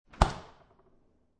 Refrigerator Door Close, Household, Refrigerator, Door Close; Kitchen